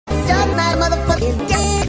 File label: wood